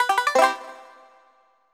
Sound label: collect honeycomb; Banjo Kazooie